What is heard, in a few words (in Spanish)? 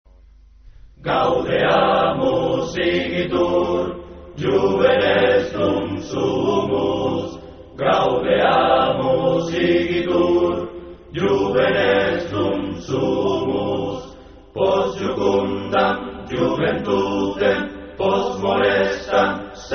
himno universitario